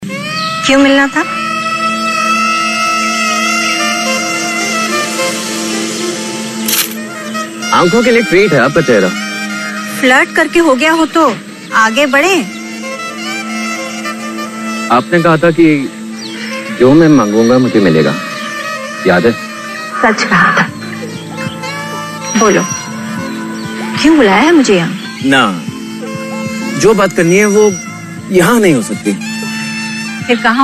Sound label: bg trumpet music; Saraswatichandra Kabir